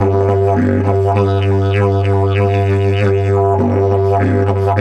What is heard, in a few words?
G 100, DIDGE 100BPM, Didgeridoo